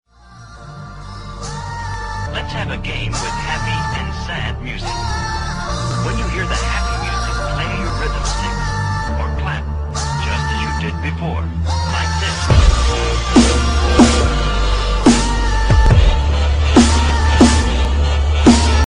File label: hiphop dubstep